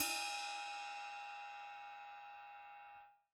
Crash, drum

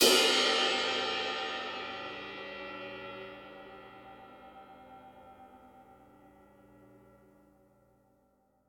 Multi Crash

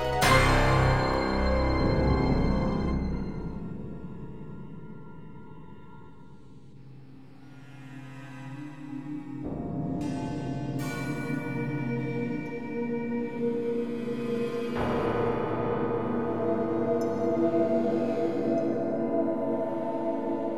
Mini Solo; VALIS

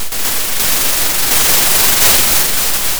pop, Sound of a thunderstorm

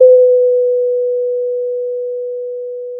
pop, Sound of a doorbell

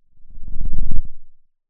Sound of a lion’s roar

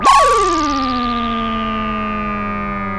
Sound of a doorbell

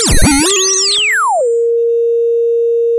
pop, sound of a bike bell

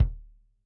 K1 close Kick, drum

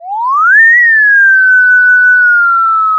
Sound of a police car siren

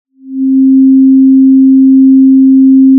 Sound of a cruise ship horn

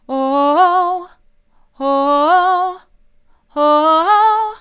oh oh oh soft, vocal

